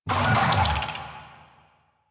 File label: Infested Big Ashen Vocal Pain; Warframe